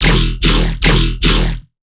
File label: Bass Shot E, Dubstep Basses; One-Shots; Chonk; Ghosthack Advent Calendar 2021 - Day 16 - Dubstep Basses